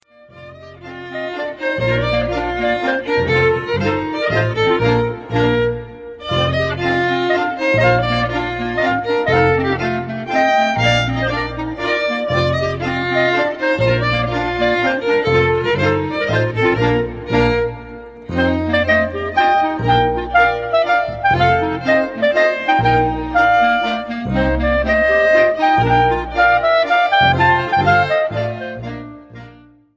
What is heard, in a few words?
Mazurka, volkstanzmusik